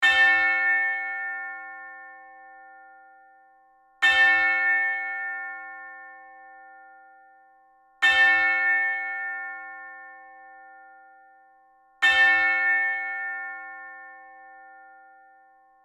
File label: Steady church bells ringing